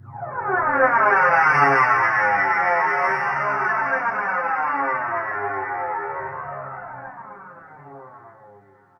SFX, synth atmos & fx; Dream Zone

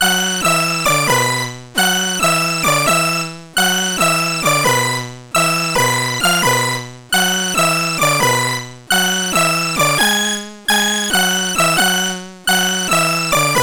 C64 Synth

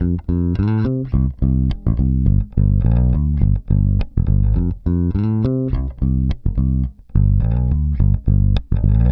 Pop, Bass

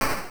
Snare; Kid Chameleon; Genesis

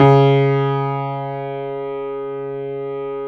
JAZZ C#2, Keyboards Acoustic; USB Soundscan vol.17

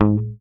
HOF MUT G#2, HOFNER MUTE, Bass

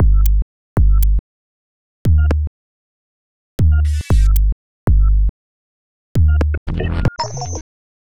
Percussive Bass

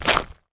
Gravel, household rhythm samples Hits

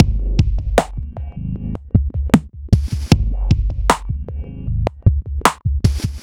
GRUNTOID, LOOPS

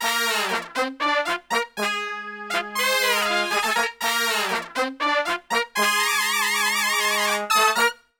POP BB, Brass; Best Service; Brass Super Section; POP LICK

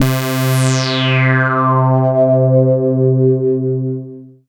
Dance Floor Bass, Multisample Basses

